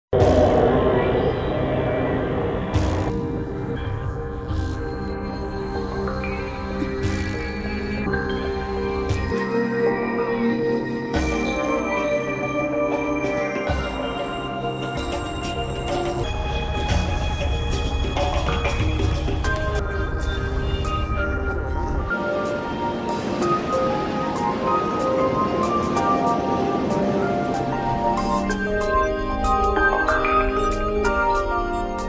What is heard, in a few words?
Sad Pensive